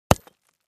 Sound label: Concrete Chunk Hit